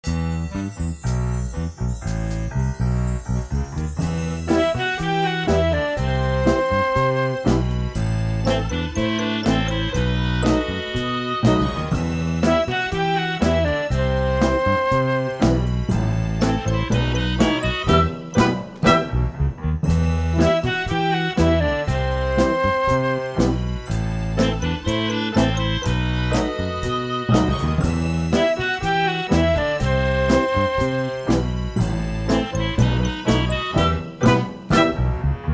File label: accomp